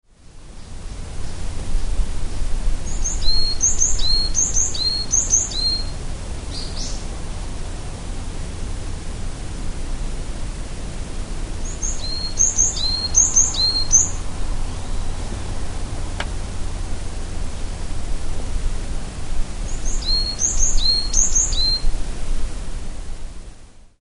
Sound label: amamiyamagara call; yanbaru